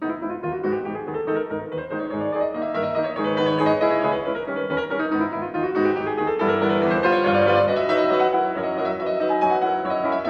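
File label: Prelude in G sharp minor, piano; Chopin; Op. 28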